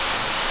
welding; movers objects

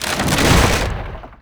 billboard fall; Tony Hawk's Project 8; XBOX; shops

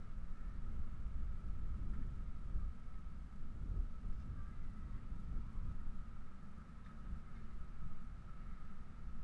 amb catacombs Entrance; Star Wars Obi Wan; XBOX

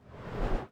Zoom In; Tom Clancys Ghost Recon Advanced Warfighter; XBOX

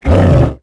hit; tusken King; Star Wars Obi Wan; XBOX

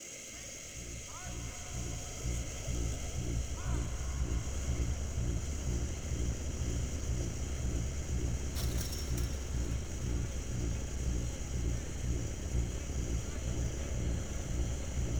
ambient two; X2 Wolverines Revenge

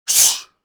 attack voice; UFC Tapout; XBOX

